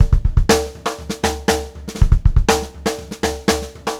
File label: FUNKY, Funk Groove Drumloops